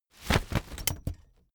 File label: umbrella big open full hard